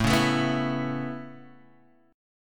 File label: A Major Add 9th, Guitar, Half Step Down